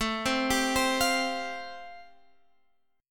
A Minor 7th Sharp 5th A, Guitar, Half Step Down

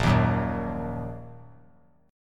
A sharp Suspended 4th Sharp 5th down; strummed; A#sus4#5; JGuitar

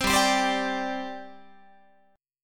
G Suspended 4th G down Guitar; Gsus4 strummed; 15,15,15,17,18,18

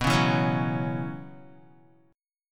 B Suspended 2nd Suspended 4th, Guitar; Bsus2sus4 strummed

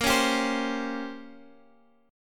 A sharp Minor 6th Add 9th, Guitar, Half Step Down; A#m6add9

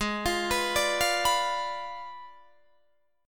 G sharp Diminished 7th G sharp, Guitar Open D

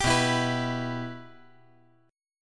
B Suspended 4th Sharp 5th B

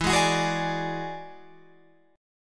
E 9th E down Guitar Open F; E9 strummed

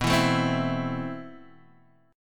C 6th B, C6/B chord; C6/B strummed

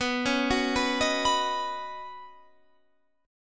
Db 9th Suspended 4th B

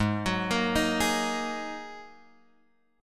G sharp 7th Suspended 2nd, Guitar, Drop D; 6,9,8,8,9,x; down; chord shape

